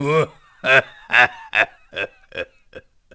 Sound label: Triforce Laugh; Ganondorf